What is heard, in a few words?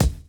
drum chop; AMG Gota Yashiki Groove Activator